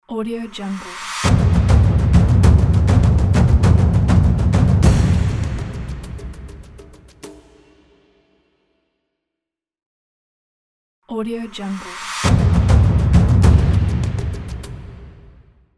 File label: epic drum percussion logo